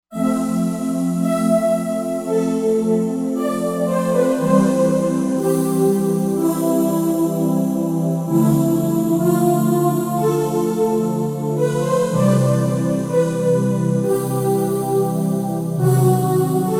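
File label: Pad Breathy Choir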